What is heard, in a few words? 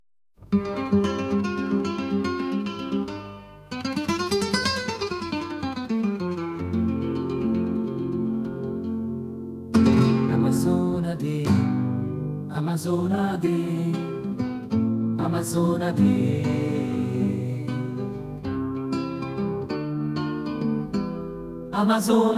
Spanish Guitar